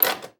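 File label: Hospital Room Interior; Medical; Blastwave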